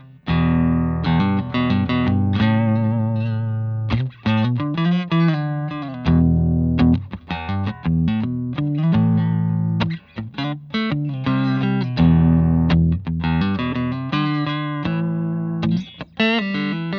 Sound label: telecaster; fender new standard guitars